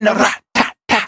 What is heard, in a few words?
"ah rat tat tat"